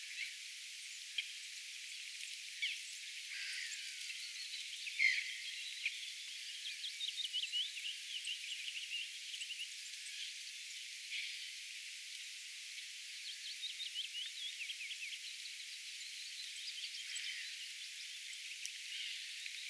olisiko tuo pajusirkun poikanen

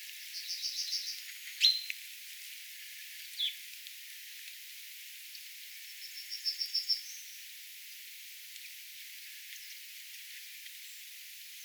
peippoja, järripeippoja, keltasirkkuja, pajusirkkuja

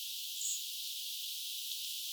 nuori keltanokkarastas olettaisin

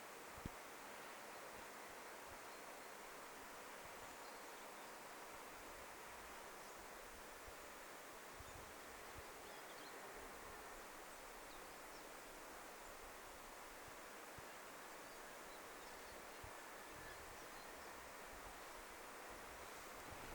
kuuluu ihan hiljaista ilm pajulintunuoren lauluharjoittelua

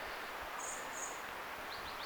tuollaiset kaksoisaanet tai ainakin niiden savy voisi merkita etta kyseessa on sinihohtora; sinihohtorastas

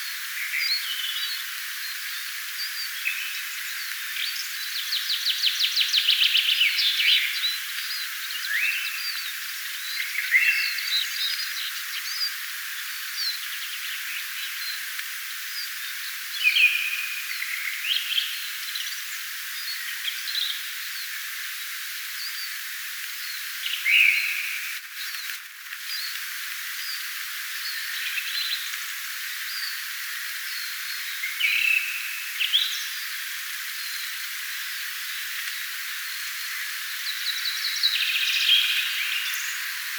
kevään ensimmäinen laulurastaan laulu

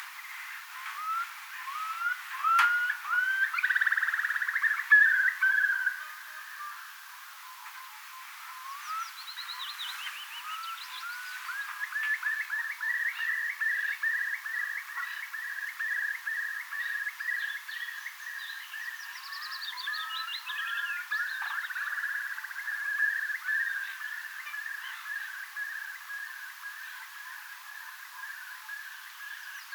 kuovi laulaa; Kaksi uutta tavipesuetta oli ilmestynyt sinne tupsu-uikkulammelle?

